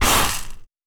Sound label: Sand gravel